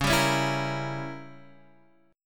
C sharp Diminished 7th C sharp, Guitar Half Step Down; C#dim7